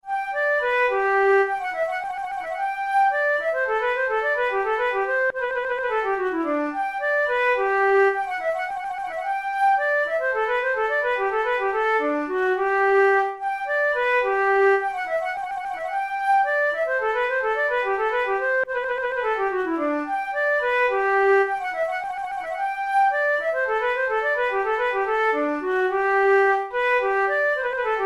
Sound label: rondeau, flute; braun pieces sans basse no4